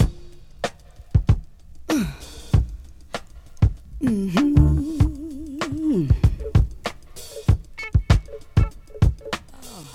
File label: 97 bpm drum loop A sharp key, kick tuned to the A# note; drum loops